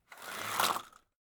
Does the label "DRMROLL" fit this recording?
No